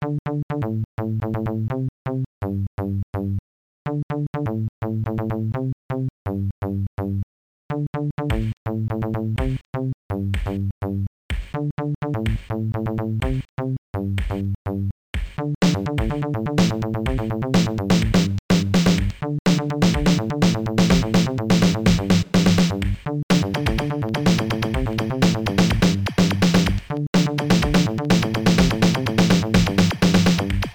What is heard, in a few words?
hit house